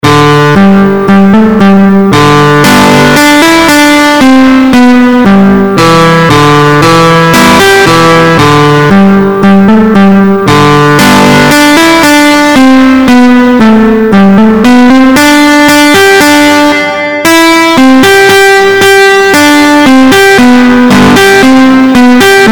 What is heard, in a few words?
boys of wexford, banjo